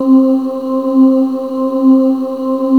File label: synth voice; Ensemble; GMTones